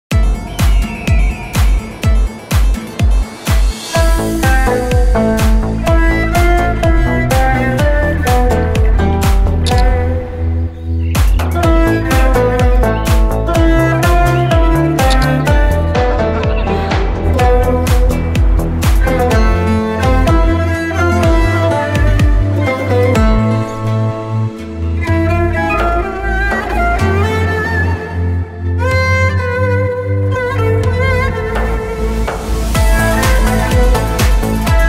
reklam muzigi; huawei p smart 2021 reklami

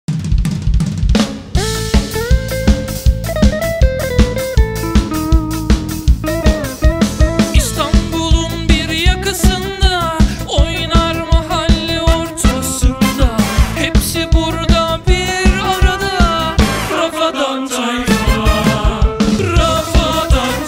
jenerik muzigi melodika; trafik tayfa; melodika gunlugu

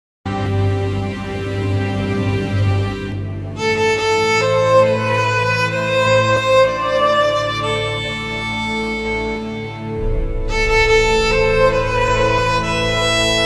muzigi keman; yuzuklerin efendisi

